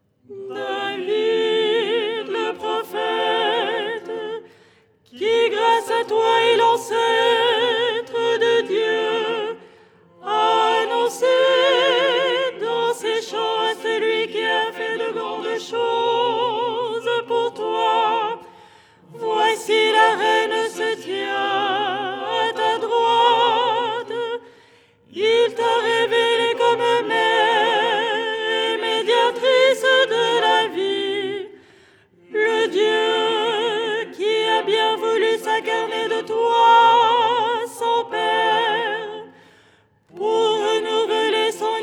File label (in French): soprano; Théotokion dogmatique; Les 8 tons en français; ton 4